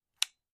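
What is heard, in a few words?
orbital sander switch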